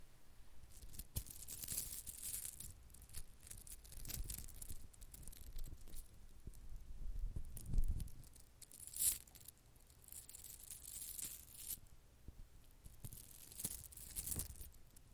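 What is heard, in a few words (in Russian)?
тонкая металлическая цепь трение столкновение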